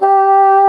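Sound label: SOFT BASSOON G4; Northstar - New Gold VOL-2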